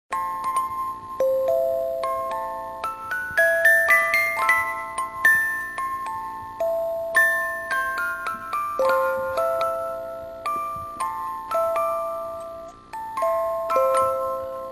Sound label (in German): Spieluhr